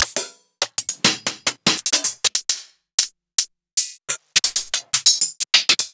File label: Closed Hihats; Sliced